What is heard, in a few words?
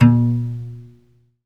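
SOLO CELLO, Synclavier Strings; ILIO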